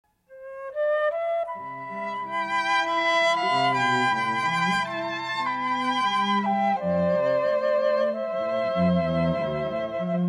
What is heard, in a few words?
Fl Trio; Cantilena South Florida